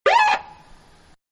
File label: Police Siren-End; Sirens; Electronics; Manhattan Production Music